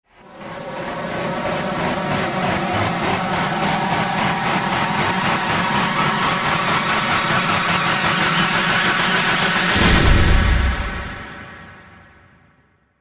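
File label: Rise Rhythmic Wind Shear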